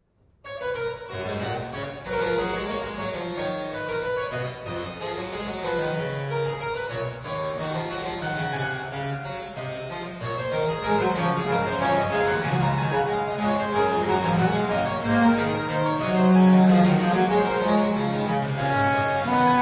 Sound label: allegro; BWV 1027